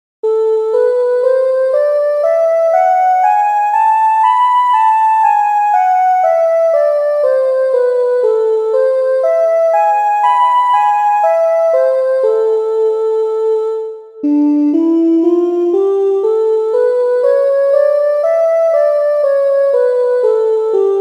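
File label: Melodic Minor jazz exercise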